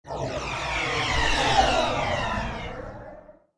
Star Command Shuttle Fly Over, spacecraft sound effect; science fiction sound effect